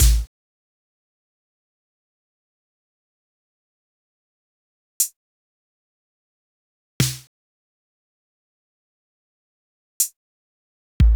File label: drum seed